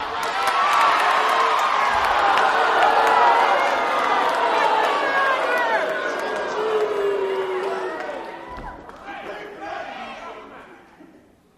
Crowd Cheers Then Boo

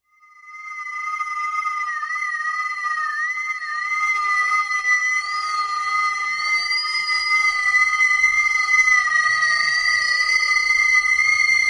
Alarm High Fre, alarms

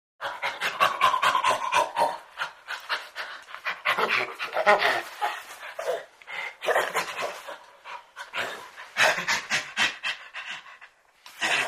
Chimpanzee Grunts, chimpanzee

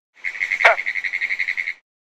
Frog Green Tree Croak

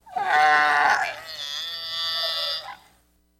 Camel Normal Weird Vo, camels

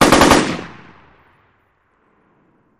Machine Gun Sngl Burst; bursts

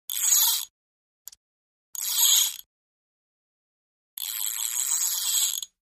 Fish Reel Light Spin, fishing

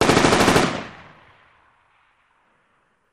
Machine Gun Sngl Rap, single burst; Machine Gun Single Rapid Fire Burst

